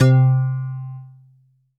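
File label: bell C3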